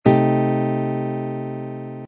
c minor sixth chord voicing, guitar

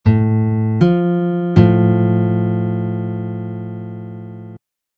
minor sixth

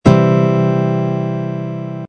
c major, guitar

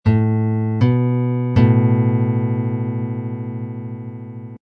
major second

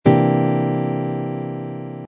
c dominant ninth chord voicing, guitar

